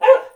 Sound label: dog med inside bark; Counter Strike Model Pack; CS:GO